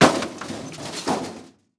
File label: Car Crash Small Crash With Glass; Impact; Crashes